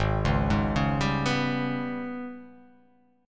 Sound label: Ab Minor Major 7th Double Flat 5th, down; AbmM7bb5; arpeggiated